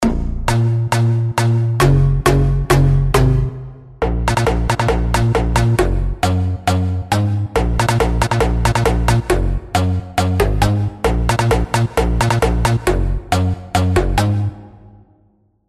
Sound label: COMP BASS DROPS